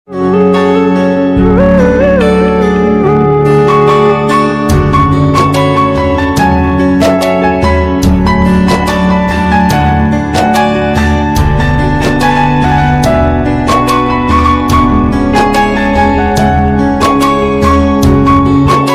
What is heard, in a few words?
Instrumental Ringtone Mobile Ringtone Sad Ringtone